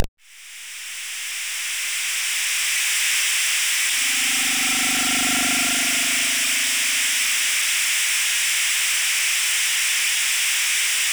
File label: Rain Funk; SIDTunes